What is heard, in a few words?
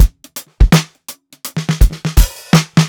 SWUNG 83 BEAT, DRUMS LOOPS; BASEMENT DRUMS VOL 2